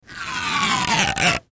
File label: Rubber Stretch, Rubber Stretch Balloon; Cartoon